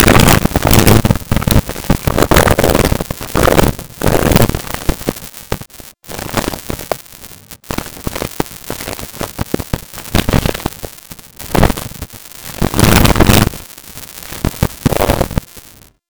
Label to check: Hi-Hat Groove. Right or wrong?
Wrong